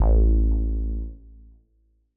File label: M Elec Bass A1; KG MegaSaur